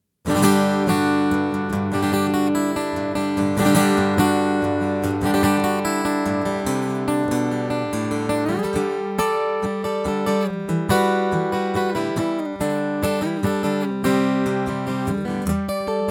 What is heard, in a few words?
Yamaha FGX5 Test Blend